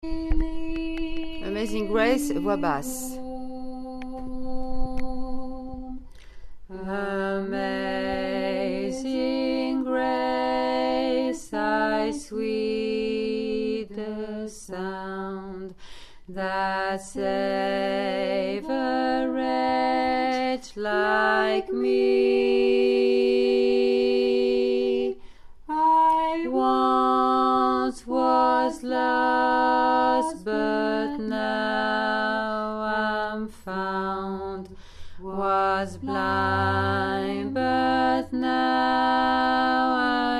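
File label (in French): mélodie et basse; amazing grace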